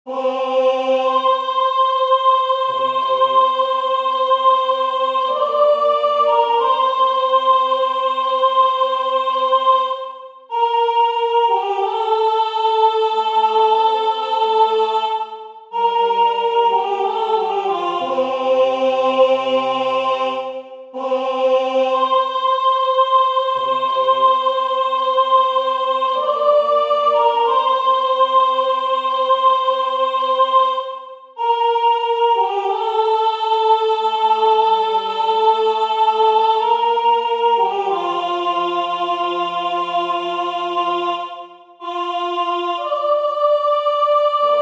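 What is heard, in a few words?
soprano; Waiheke Island Choral Society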